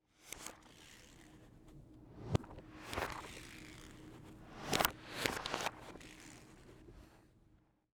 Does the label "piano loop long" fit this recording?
No